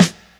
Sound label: raw steel snare drum f sharp key